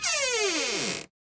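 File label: step floor; ambience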